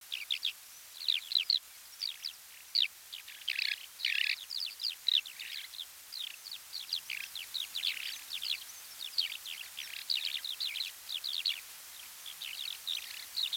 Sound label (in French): Alouette des champs Alauda arvensis